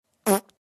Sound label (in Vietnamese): tiếng bét